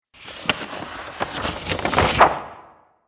Pirate Treasure Map, Cartoon Sound Design Sound Effects